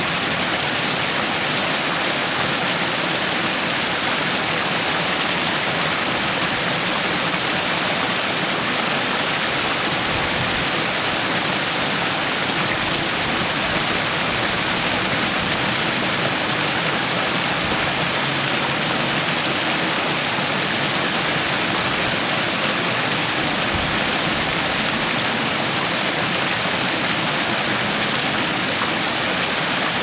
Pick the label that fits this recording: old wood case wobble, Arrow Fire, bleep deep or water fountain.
water fountain